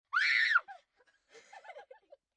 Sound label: Group Laugh Yell, Scream, Group, 2 children, single, short, giggle, kids; males, females, girls, boys, human voice; Screaming